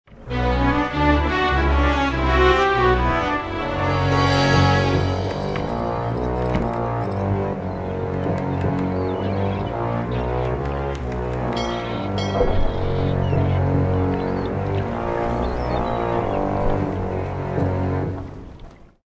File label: Losing a Sword Fight; monkey island 3